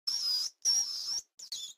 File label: rat pain